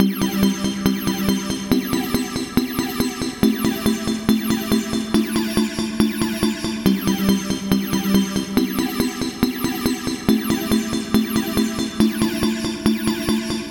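Bpm140 Pad Loop C major Am F major, Dubstep Chord Loops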